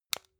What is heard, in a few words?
flashlight push in heavy